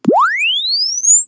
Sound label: press one finger Chirp; Samsung